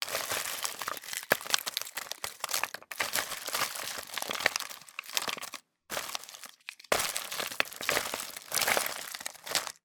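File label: food candy with wrappers in plastic bowl rummage and drop sweets; zapsplat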